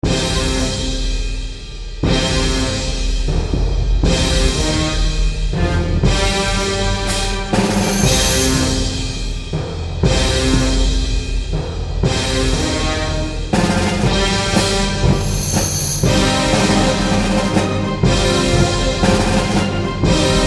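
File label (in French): Colère, Les émotions; Colère 3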